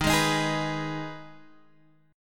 D sharp Suspended 4th Sharp 5th D sharp, Guitar Half Step Down; chord